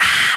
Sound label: "ah" exhale